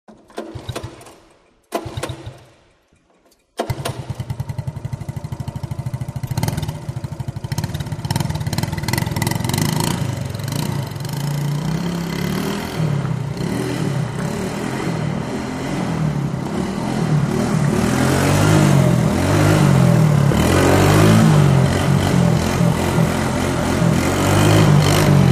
Motorcycle; Start / Circles; Honda Trike Start Up And Goes Round Wall Of Death, Finally Trike Slows, Stops, Small Revs And Switch Off.; trials bike